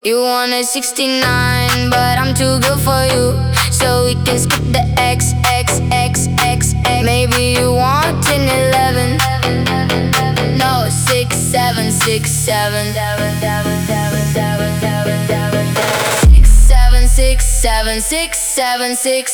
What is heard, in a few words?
phonk